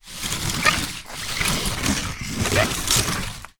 foley cardboard box lid scrape slide movements on hard floor; zapsplat